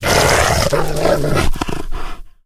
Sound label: pdog aggression, pseudodog; russian; sven; russian sounds